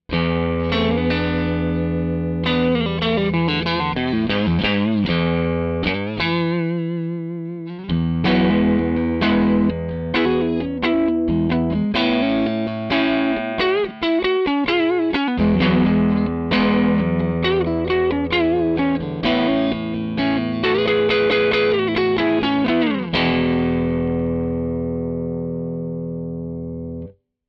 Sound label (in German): Bassman pur